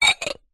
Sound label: household ceramic bowls square x2 stack